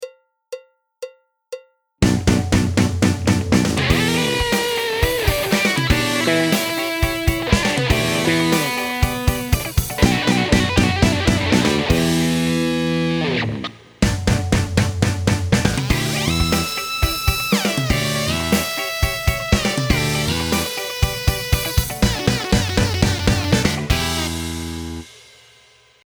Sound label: SOLO 120 Em